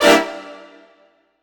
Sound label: Brass Stab; Dr. Fink's Funk Factory